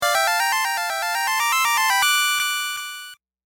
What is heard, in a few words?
Jingle Level Complete; Crystal Catacombs